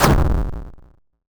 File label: Fire; Syrup 2 Candy Alchemy RPG